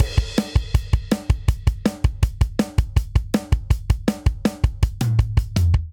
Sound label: Punk Rock Verse Variant 162 bpm; 4 4; MIDI Clips; Ableton; Factory Packs; Drum Booth